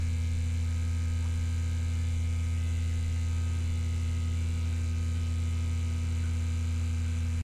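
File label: Sewer Light loop; silent hill homecoming 2008 gamerip pc ps3 x360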